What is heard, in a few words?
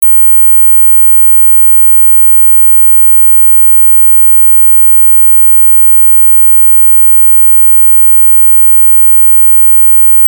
anti mosquito song